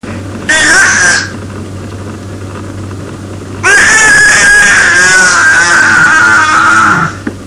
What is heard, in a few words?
squeek, baby